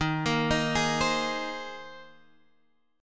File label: C Minor 7th Sharp 5th D sharp